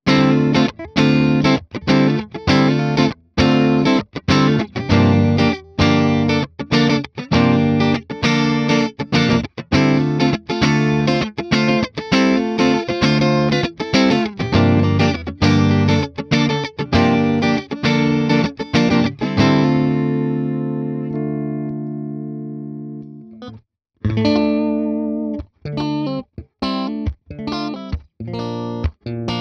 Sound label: Morgan Amps PR15 Vol EQ 12 Uhr